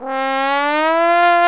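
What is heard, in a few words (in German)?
posaune auf C3, trombone; Best Service; Alpine Volksmusik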